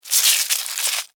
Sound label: Insect Move Wet, Insect, Movement, Wet, Bug; Animals:Insects